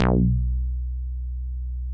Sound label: MOOG1 BASS B1